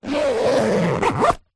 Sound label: foley bag backpack zipper; zapsplat